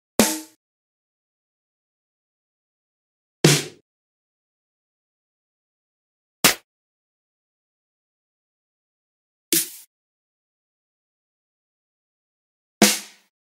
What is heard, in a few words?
Snare Drum; اسنیر درام